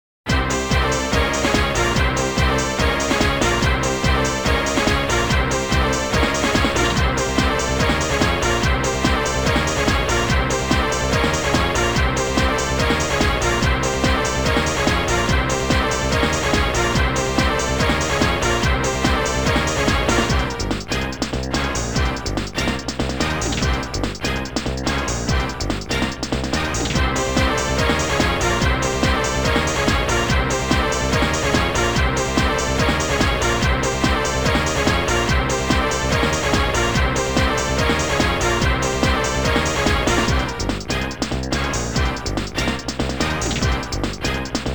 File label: Funky Chainsaw; ultimate ecology